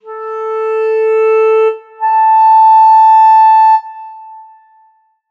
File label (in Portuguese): clarineta 440 880 hz